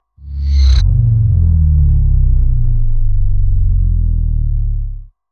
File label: cinematic